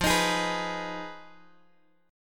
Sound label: F Minor 7th Flat 5th, Guitar, Open G